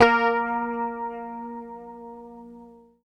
A#3, Bass Library, PICK; Sampleheads; Will Lee Bass Library

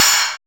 GRUNGOID HI; TEK PERC1; Drums In Your FACE!

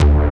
SYN SNORC, dance synth; Sound & Vision - Gigapack I CD 2 (Roland)

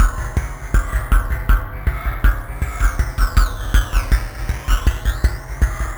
RISK FX, Industrial Loops

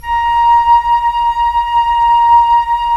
FLT FL, Flute; Roland - LA Composer vol. 4

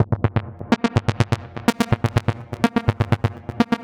squeaky rubber Bb 125, synth loop; Zero-G; Soundclash; Nu Dance Electro

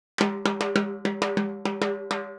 Timbali, Latin Percussion

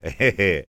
LAUGH, Jazz; Spectrasonics Vocal Planet; Jazz FX

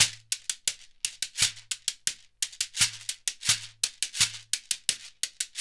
SHEKERE, Synclavier Percussion; ILIO

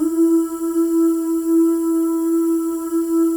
OO, FEM OOS, Voices; Spectrasonics

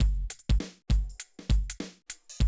drum loop, 100 BPM

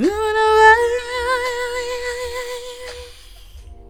FEMALE VOX, Hip Hop

